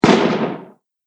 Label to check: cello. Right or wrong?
Wrong